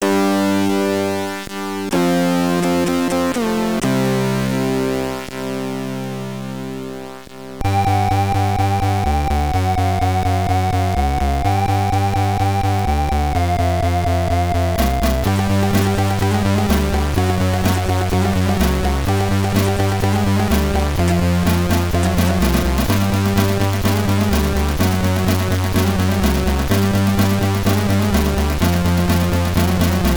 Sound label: Game Over (SID Stereo), commodore 64; atomino